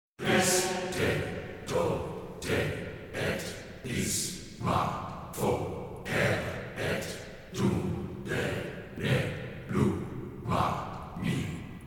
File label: choir only